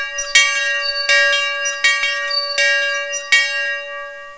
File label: Short Bell